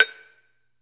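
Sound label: HI BAR F#, Metalophones, Pitched Percussion; E-MU Producer Series Vol. 7 – Old World Instruments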